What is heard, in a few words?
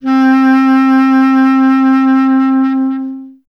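wind bass clarinet; Miroslav Vitous - Solo Instruments VOL-1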